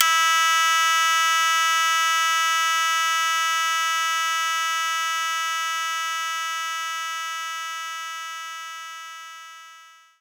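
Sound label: Shamisen F#2; knights try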